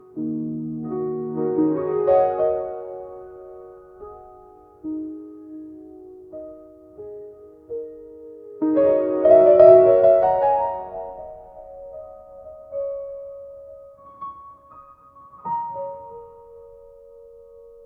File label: jazz piano fragmented mel